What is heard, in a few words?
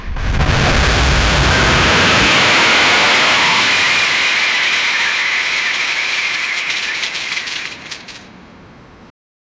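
a sudden loud crash, accident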